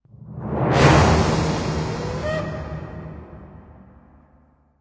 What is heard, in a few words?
STING; The LEGO Ninjago Movie Video Game; PS4; Windows; Xbox One